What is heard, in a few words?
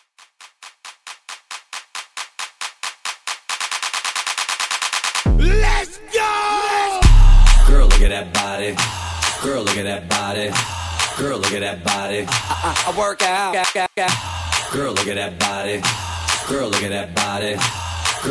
Clap, 136 BPM